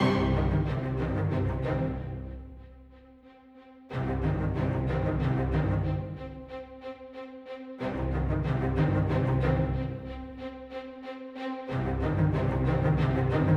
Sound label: Cinematic Music - High Octane